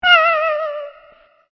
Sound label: echoed screm